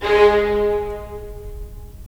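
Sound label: strings violins, right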